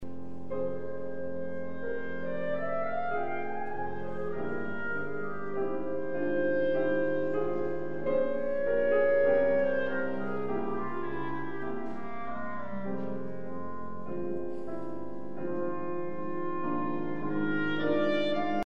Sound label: clarinet music